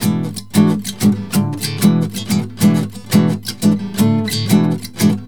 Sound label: GUITAR; USB Soundscan vol.63; Balkanish Traditions; AKAI